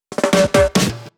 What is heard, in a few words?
Fills, Loops 140 BPM